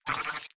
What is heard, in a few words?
Cry; Chromeon